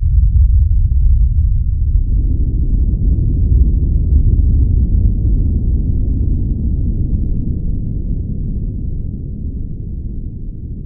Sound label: soundscape indoor boom